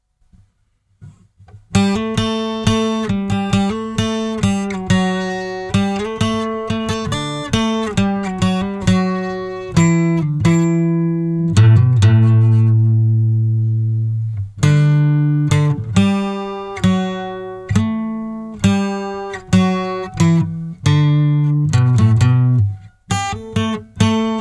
12 string D 135; JeffSpeed68

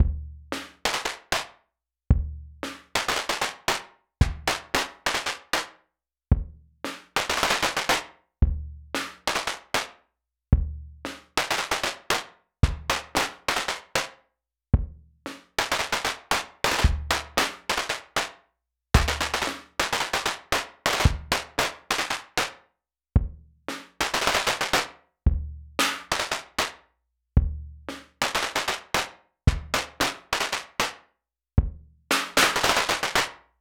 Fife N drum

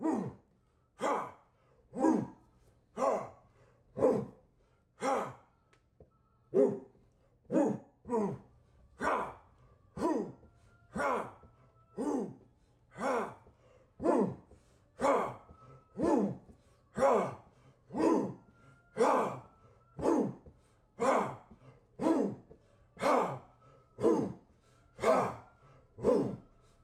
Orc March Choir

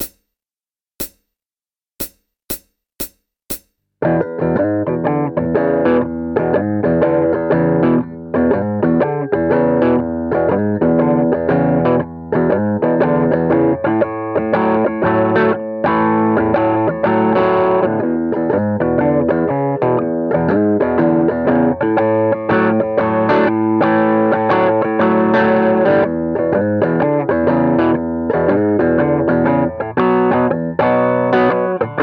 Blues Rock 120

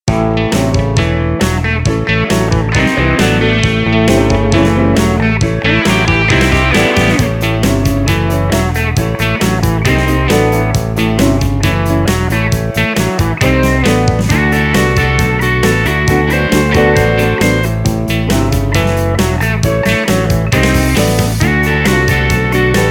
Country Rock in A 135 BPM

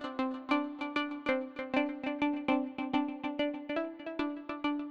synth Liquid Keys 100bpm G

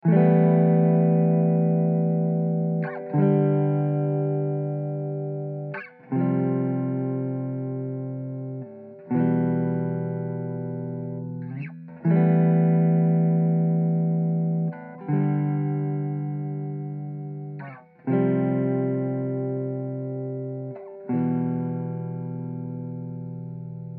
Am 160 GTR CHORDS

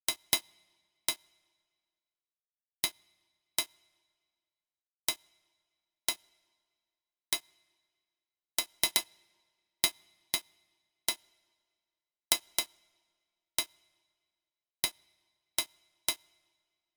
Dark Church

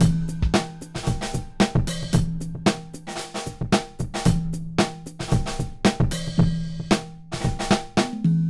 Drumloop; ccPlus Pepita

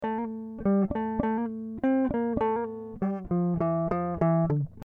Dm 100 GTR Riffs

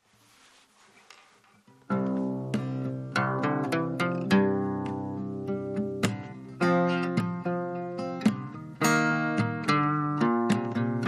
Urban Groove

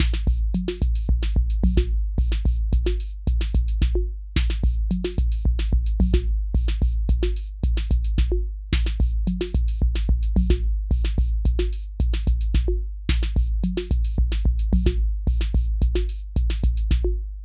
drums

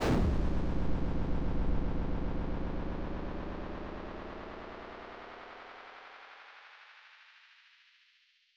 noise shot; Piper in the Night

